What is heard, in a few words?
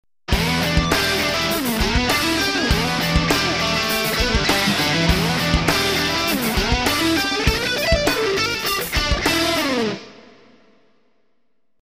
jingle